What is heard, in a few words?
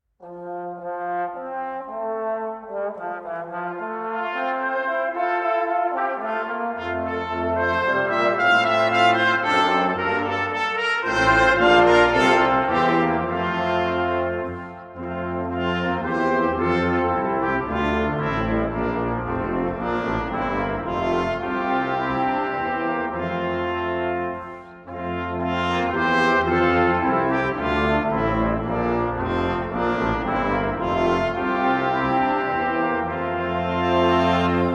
Choral, Brass